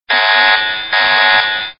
Sys Alert

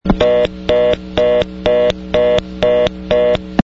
reorder, Bell System

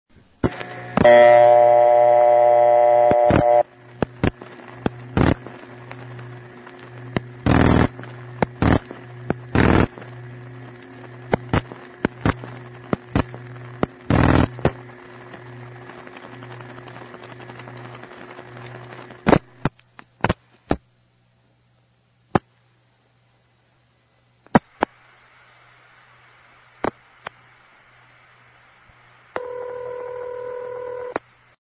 Crossbar Dialing Toll, Bell System

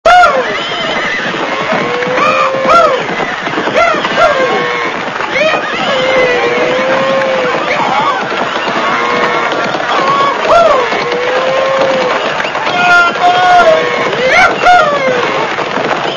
Sound Effect - Cattle Drive

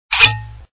Cork Pop; NewYears

